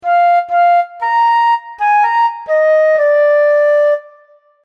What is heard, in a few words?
goldilocks theme